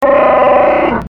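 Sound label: The Wookie Growling; Chewbacca; The Wookie From Star Wars Growling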